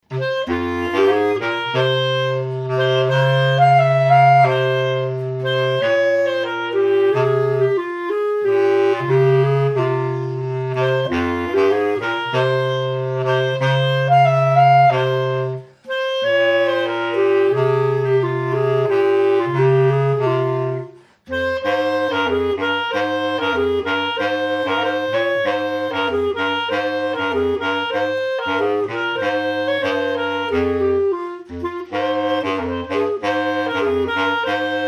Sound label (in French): Bourree; aurore sand